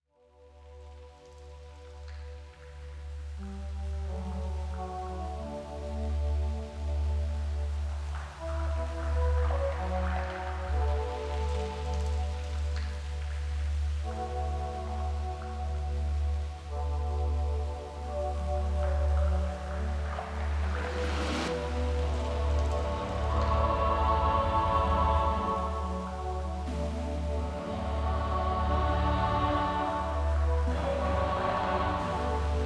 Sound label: Gentle Passage Pads